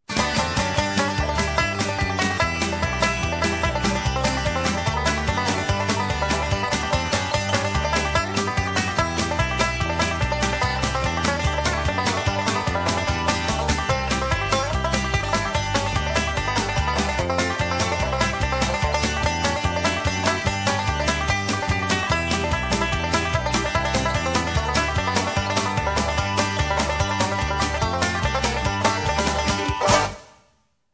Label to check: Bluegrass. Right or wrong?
Right